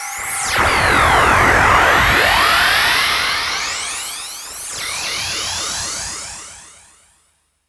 SPACE-FX

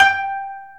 KOTO G4, Asian Traditions; USB Soundscan vol.60 - Asian Traditions; AKAI

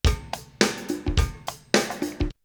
Drumloop; ZERO G - Datafile DISC 3

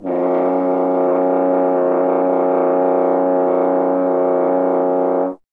F. Horn; Miroslav Vitous; Solo Instruments VOL-2

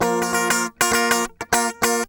GUI, 100 BPM

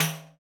TAMBO, Tambourines

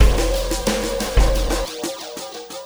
Ripple Perc

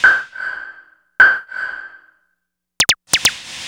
FX; Sonic Foundry; Stylus Pressure Urban Grooves on Digital Wax; Gotta Bounce

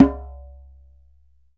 DJEMBE, African Perc

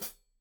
Hat Foot, Hi-Hat, Cymbals Stereo

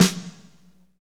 SNR, Snares, Roland

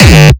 kick

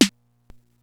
TR, drums perc, Roland

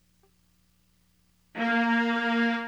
Hip Hop and R&B Drumloops

